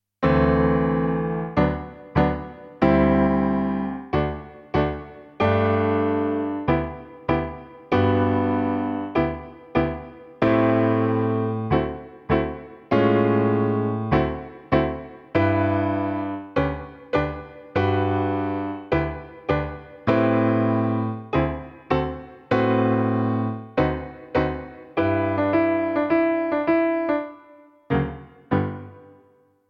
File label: karaoke